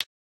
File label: click, interface sounds; p0ss